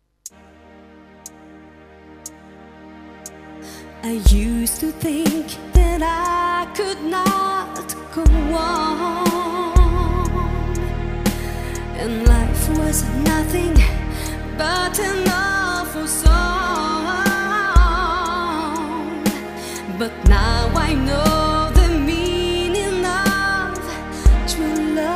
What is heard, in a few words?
Gospel Lead only